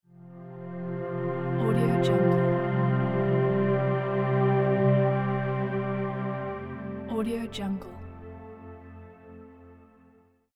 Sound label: sleepy transition